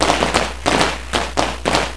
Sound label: firecrackers